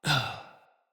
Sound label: Death, Battle Barks; Envoy